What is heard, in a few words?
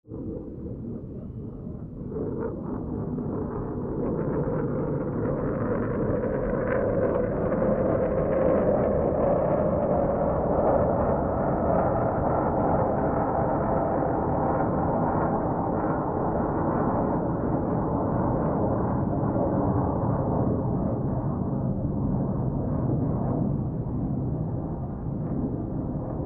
jet passing